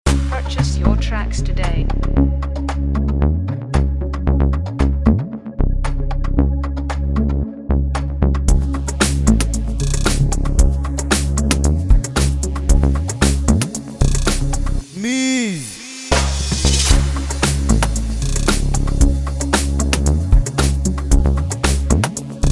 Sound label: FREEBEAT, amapiano typebeat